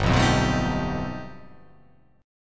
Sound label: G Augmented 9th